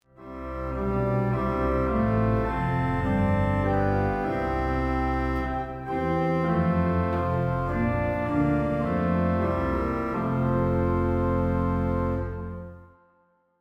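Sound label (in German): Orgel, pfeifenorgeln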